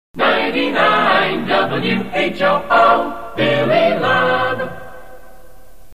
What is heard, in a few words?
accapella jingle jock ID